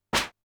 snare, Drum Machines, Formanta Radio Plant Polivoks